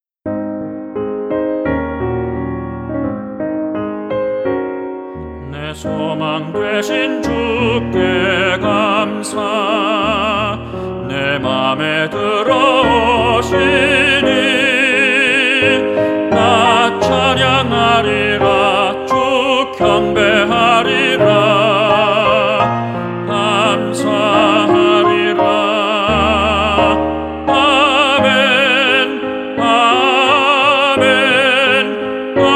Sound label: TENOR